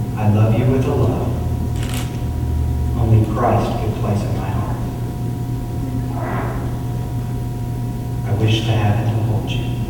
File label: Fart